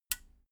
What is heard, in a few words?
metal button radio push power on